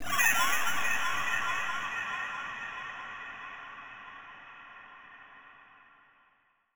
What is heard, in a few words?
TECHNO FX 140